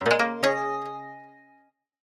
UI, strings, ethnic, tonal, plucked, aura, hold release; Start Select